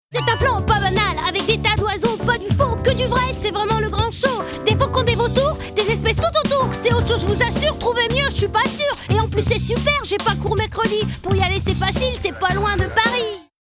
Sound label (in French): Rap garcon, voix chantees